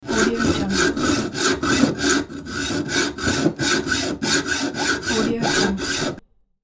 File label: saw hack